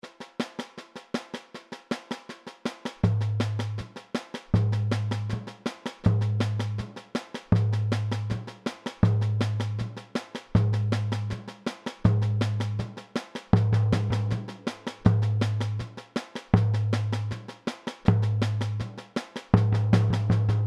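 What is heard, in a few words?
primera variations, samba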